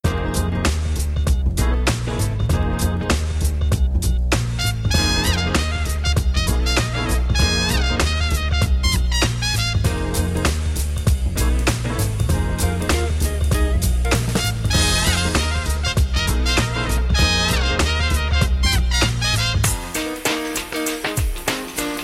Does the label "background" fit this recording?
Yes